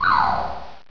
pyuon

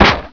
step

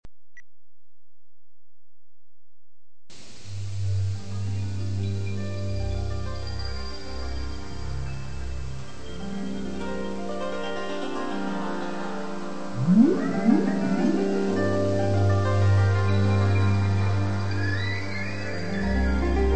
whale song

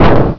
dogon

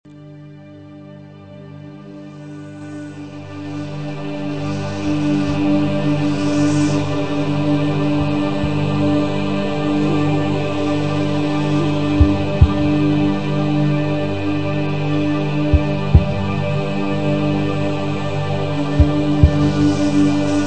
chakra sound

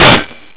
slash

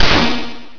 beam

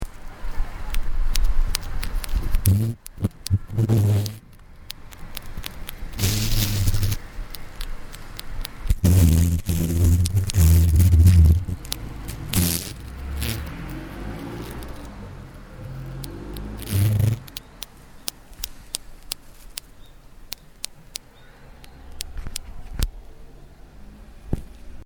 warning

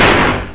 dash

